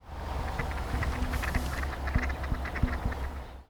karugamo, bird song; カルガモ; 鳴き声; 新潟県新潟市西蒲区松野尾 上堰潟公園

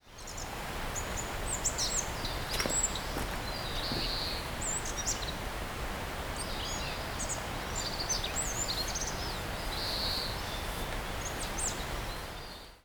kosamebitaki, bird song; コサメビタキ; さえずり②; 福島県只見町 日宮沢; J-eco 鳥のさえずり図鑑

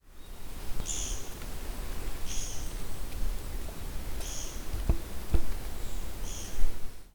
kogera, bird song

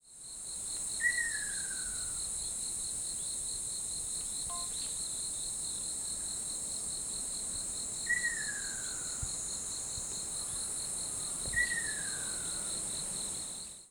ryuukyuuakasyoubin, bird song; リュウキュウアカショウビン; さえずり; 沖縄県国頭郡国頭村; J-eco 鳥のさえずり図鑑

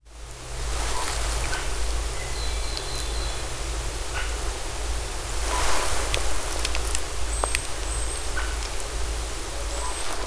oosutonooakagera, bird song; オーストンオオアカゲラ; 鹿児島県奄美大島 自然観察の森

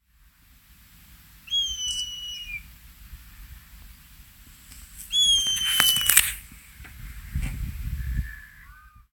nosuri, bird song

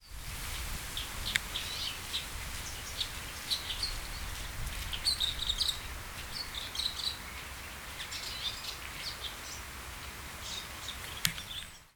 komukudori, bird song

